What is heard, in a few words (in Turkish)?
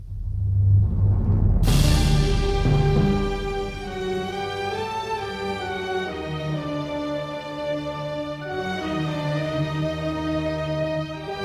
film muzigi; islami zil sesleri